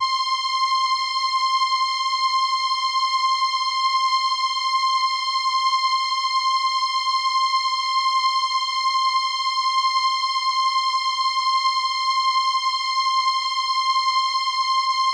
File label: Trumpet C7, ARP Solina